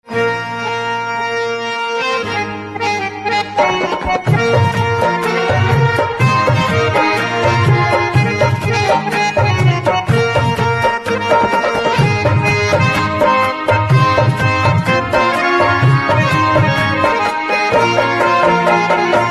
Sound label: Harmonium Instrumental; Ringtone